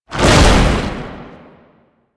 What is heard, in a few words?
Tank Infested Swipe Whoosh; Enemies; Abilities; Warframe; Lasrian